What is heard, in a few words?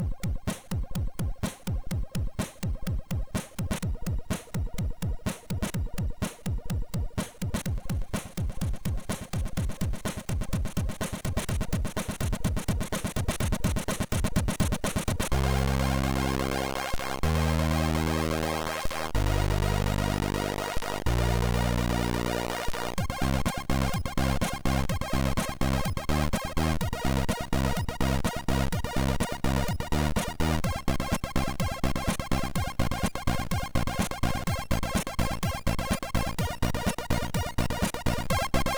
sid, MOS6581R4